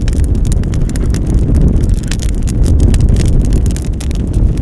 fire, ambience

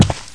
stone

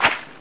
player grass

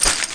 player leaves

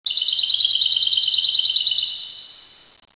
bird

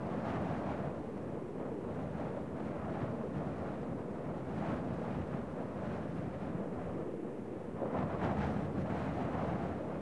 Gusty

